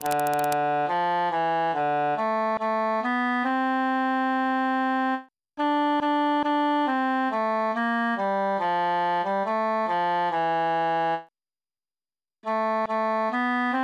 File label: altus solo; now wolde y fayne